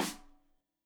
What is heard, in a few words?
Rim Shot Stereo; Deeply Sampled Drums; SMDrum